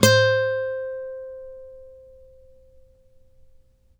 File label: ACUSTC C 4, ACOUSTIC guitar; East West; Steve Stevens Guitar Samples Collection; ACOUSTIC PCK